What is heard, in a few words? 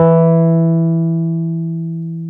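piano, Wurly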